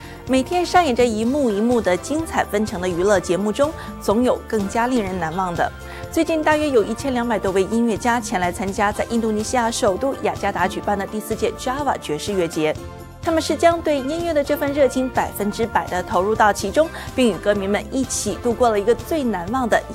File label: JAZZ FESTIVAL; INDONESIA; 娛樂新幹線